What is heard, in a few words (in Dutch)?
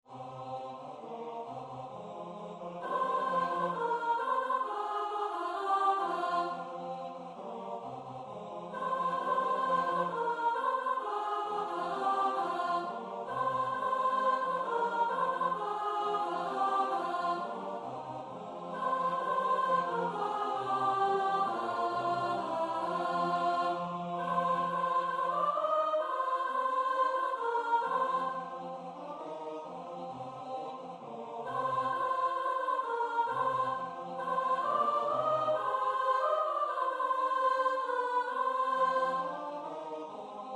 sopraan midi; ons musyckboexken 2016